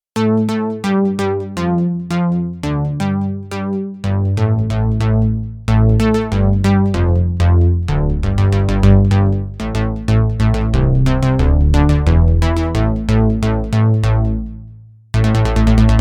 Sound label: Line Bass, Korg Radias-R